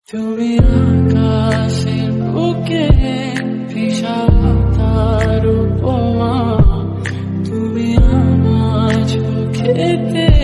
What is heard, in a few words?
LOFI BENGALI RINGTONE